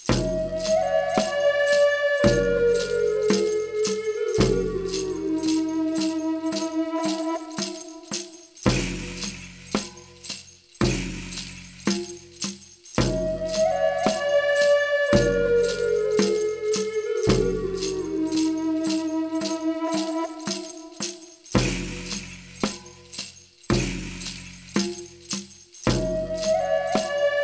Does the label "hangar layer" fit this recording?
No